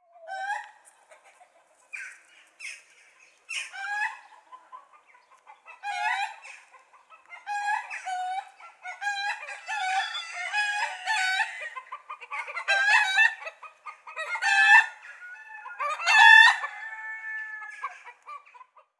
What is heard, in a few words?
turkey